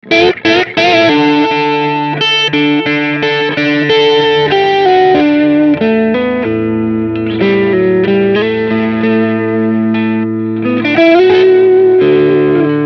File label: marshall plexi plugin clean warm fet mikro; uad